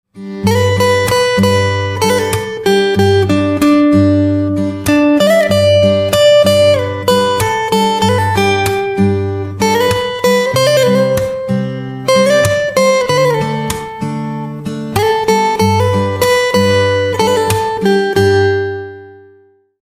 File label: Guitar